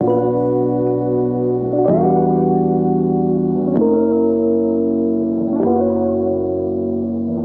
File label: keys, Phonky